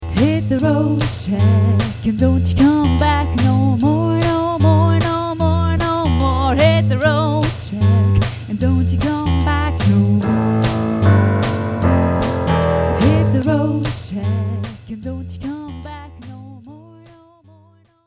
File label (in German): Unsterstimme